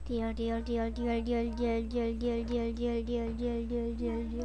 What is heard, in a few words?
deer